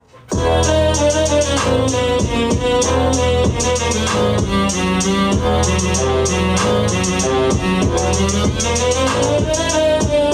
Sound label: circus beat